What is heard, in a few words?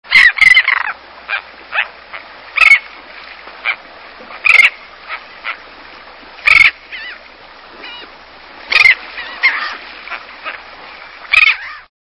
Royal Tern